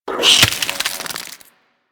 Devour Enemy Bite; Warframe; Mummy

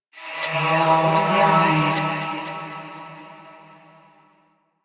Hand Compass Vocal; Warframe; Duviri

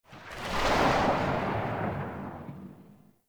Infested Shotgun Tail; Weapons

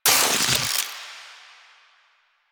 Epitaph Prime Reload C, Weapons Sidearm; Warframe; Tenno; Wraith Sidearm

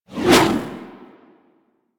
Spear Throw Fire C, Weapons; Warframe; Corpus; Spear Gun

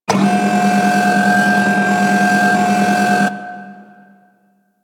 Ship Turret Move A; Player Path Beam; Fomorian

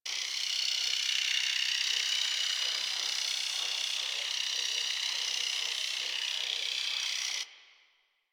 Sacrifice Shrine Mystic Light Loop

